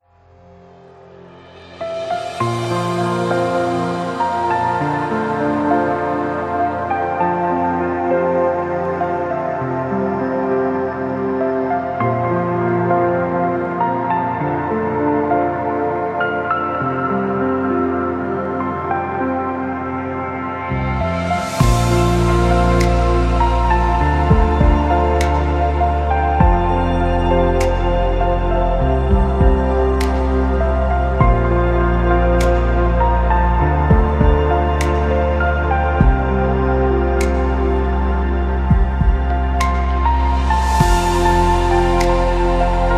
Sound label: Wedding Piano